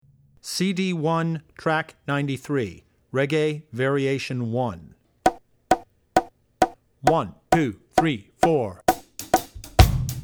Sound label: Reggae; Drummer's Bible Tracks; Reggae (Variation 1)